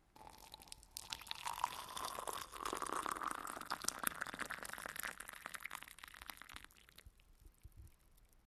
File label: hot water in cup